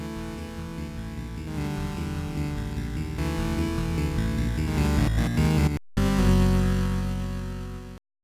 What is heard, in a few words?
Music - Jingle; fushigi no umi no nadia the secret of blue water; pc engine cd; turbografx 16